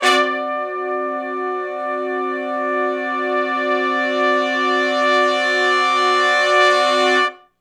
2 ms Long Note w Swell (D) har-dom 7th, Horncraft for R&B; Sonic Foundry (Sony Creative Software); Sections